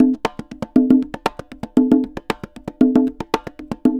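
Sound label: CONGAS SALSA, salsa drums and percussion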